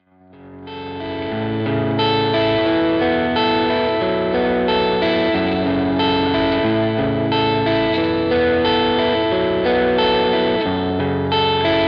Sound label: ts 9 keeley baked